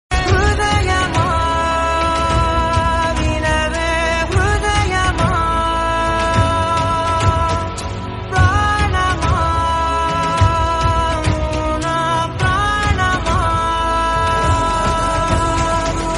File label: bgm telugu ringtone; Major